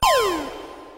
Toss; TossPokeball; P3D-Legacy; 2.5DHero

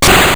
shutter; P3D-Legacy

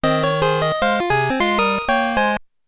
Receive Phone Number; P3D-Legacy

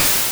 Poison Sting Start, Poison Attacks; P3D-Legacy

